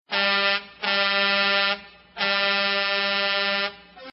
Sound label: Big Truck Messagtone